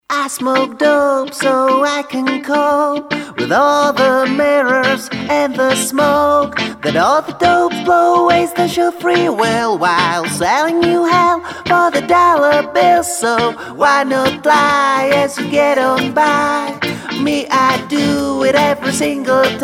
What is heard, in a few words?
moonshine reggae